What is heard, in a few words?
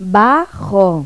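bass; Pipo-Aprende musica con Pipo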